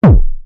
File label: BD, vermona drm1